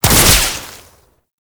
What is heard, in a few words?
Web Tangle Shot; Boss; Enemies; Abilities; Warframe; Oraxia